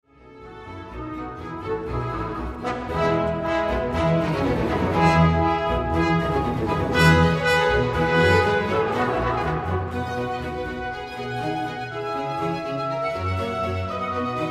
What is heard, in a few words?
Horn